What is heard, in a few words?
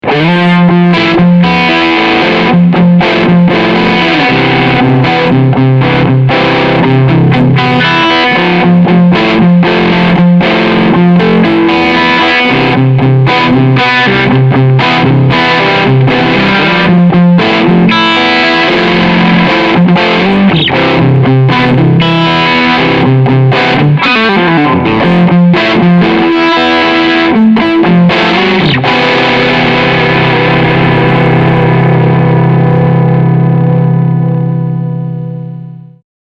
Overdrive